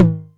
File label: Tom Hi, Novation Drumstation; DrumstationV1